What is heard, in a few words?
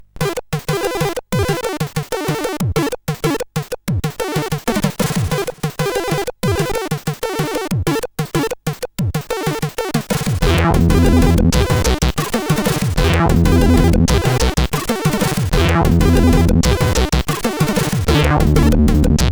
sid CSG8580R5; Sqbany